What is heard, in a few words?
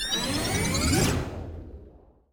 wild Win; netent; multipliermayhem